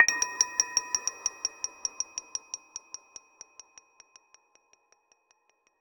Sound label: a mechanical tactile clic, luxury